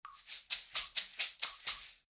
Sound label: SHAK, Percussion Loops